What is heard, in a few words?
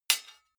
sword sabre metal hit